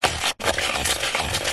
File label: technology studio controller scrub dial turn; zapsplat